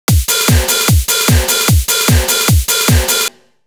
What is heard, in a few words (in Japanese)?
キック＋スネア＋オープン／クローズハイハット; jpop 4beat rhythm miryoku tukurikata